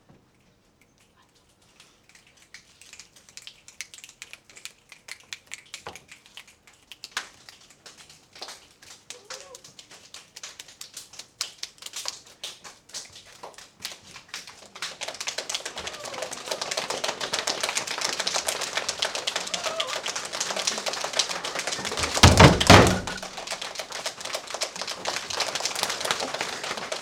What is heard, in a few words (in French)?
Orage